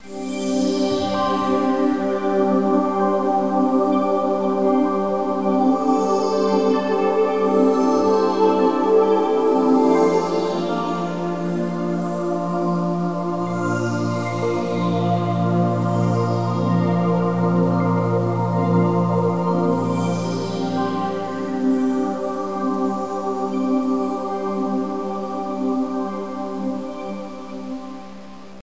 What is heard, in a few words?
Pad; Great Eagle 2